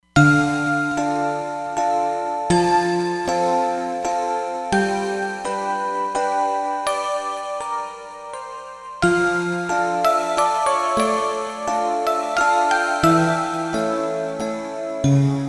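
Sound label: Music Box Pad, Roland Fantom XR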